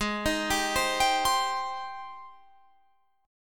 Ab Major 7th Ab down Guitar Open F; AbM7 arpeggiated

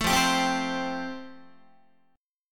F Major Add 9th, Guitar; Fadd9 strummed; JGuitar

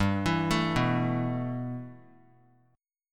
G 6th Add 9th; G6add9 arpeggiated

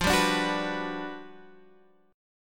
G Major 7th, F; strummed; JGuitar

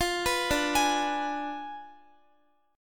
D Diminished 7th D, Guitar; Ddim7 arpeggiated; x,x,15,16,14,15

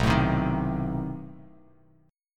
B 7th Flat 9th B down, Bass Standard; B7b9 strummed; JGuitar

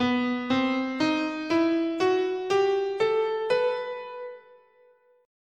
B Phrygian Dominant

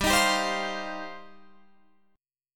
G 7th Suspended 4th Sharp 5th, Guitar Open F; Listen to G7sus4#5 strummed; G7sus4#5 Chord

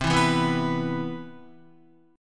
C Major 7th, Guitar; CM7 strummed; JGuitar

